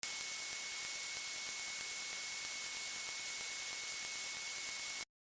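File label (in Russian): тонкий писк в ушах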